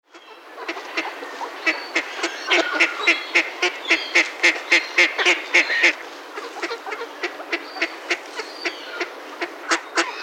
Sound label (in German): Stockente; Gewaesser; Tierstimmen